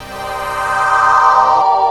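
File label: chord, Electro 125; Big Beat Drumloops; USB Soundscan vol.25; AKAI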